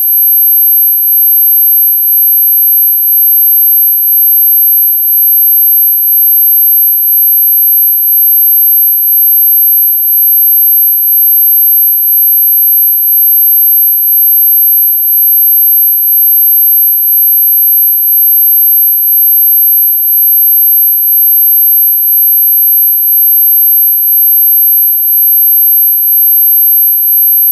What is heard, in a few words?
whistle